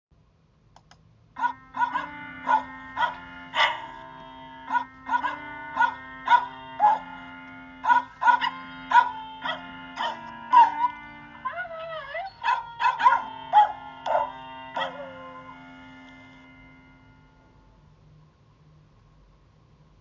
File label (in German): Singender Musikballon Happy Barkday to You; mit Hund